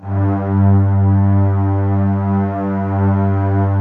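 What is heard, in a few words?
violins, low violins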